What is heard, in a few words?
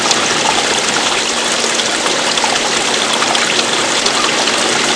waterfall